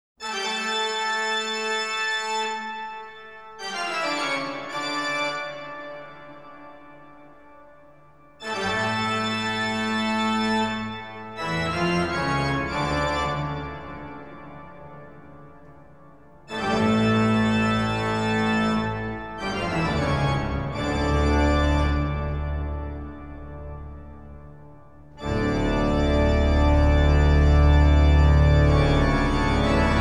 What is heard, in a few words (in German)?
Toccata und Fuge d-Moll; Johann Sebastian Bach; BWV 565